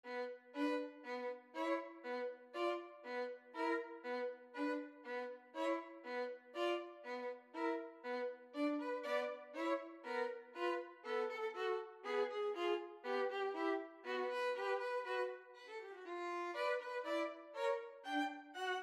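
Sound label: tchaikovsky sugar plum, violin duet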